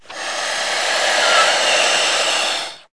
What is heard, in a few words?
BLOWUP; HUMAN